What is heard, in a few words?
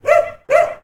Dog Bark Eleven Double Bark; DogBarks 1.0